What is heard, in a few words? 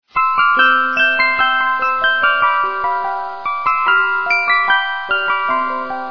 polka; Reuge